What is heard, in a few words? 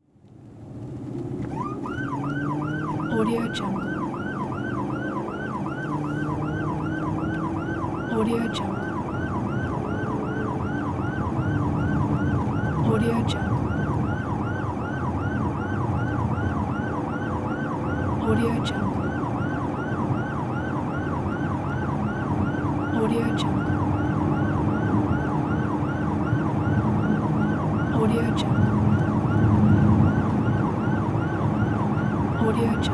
police siren interior perspective; applehillstudios